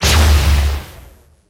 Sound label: bigshot; water tower eye